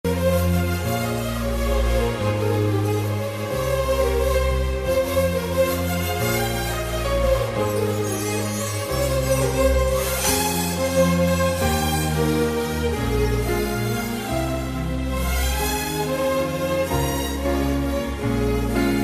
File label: melodious violin ringtone; galaxy